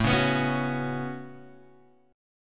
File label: A 6th Add 9th A down Guitar Open G